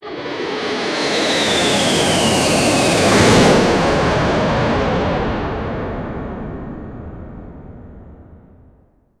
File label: jet whoosh, airplane